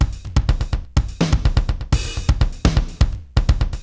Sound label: Akai XE8 (125BPM), beats